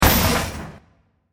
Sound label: Bursting Metal; Impacts; Bursts; Crafted Signals